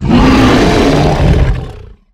Harpooned; Evolve; Behemoth

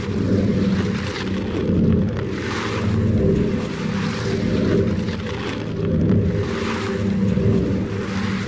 Ability Spirit Walk Drone Loop; Lurker; Evolve; Gorgon

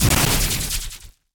Lightning Strike Aim Release; Evolve; Elder Kraken

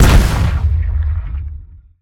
Seismic Blast Fist; Evolve; Behemoth

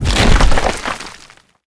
Spider Trap Activated; Lurker; Evolve; Gorgon

